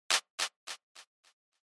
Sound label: Short Accent Single Delay